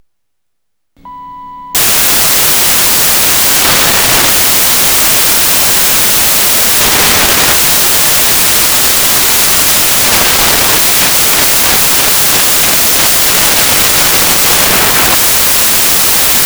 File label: tones